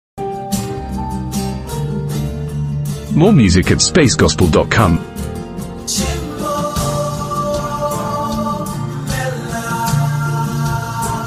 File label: guitars; Scotland